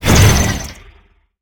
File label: staff fire; smite; ahpuch skin10